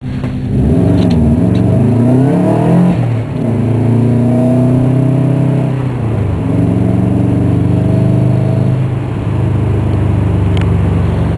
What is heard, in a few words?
wrx exhaust; buschur